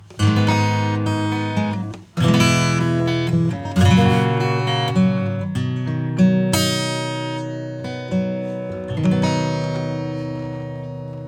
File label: acoustic EQ